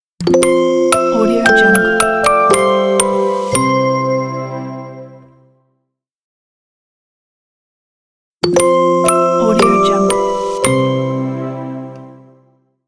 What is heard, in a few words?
a childish thing ident